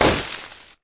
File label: snowball breaks; Angry Birds Seasons